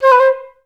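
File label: flutes C4; Best Service; Peter Siedlaczek's Advanced Orchestra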